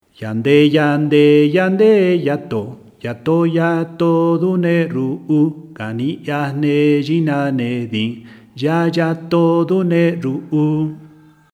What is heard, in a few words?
song sung without guitar